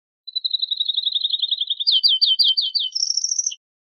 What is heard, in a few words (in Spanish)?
acanariados, verderon